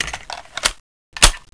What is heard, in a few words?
Inf Weapons Foley 3rd; Red Orchestra 41-45